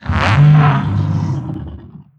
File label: dry, strider idle; creatures; combat; vox